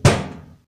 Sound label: metal